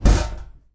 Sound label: metal bash; SupaLidlGame